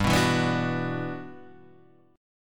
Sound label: E Minor 9th G